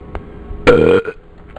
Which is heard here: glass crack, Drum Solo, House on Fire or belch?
belch